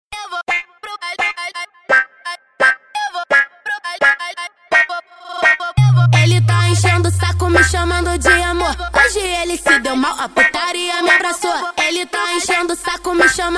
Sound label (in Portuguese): baile funk